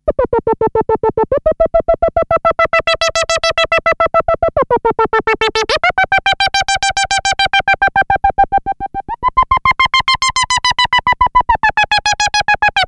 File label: M-500SP Synthe Traveler REPEAT, Korg M-500SP Synths